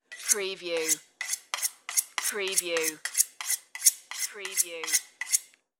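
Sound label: Sharpening Knife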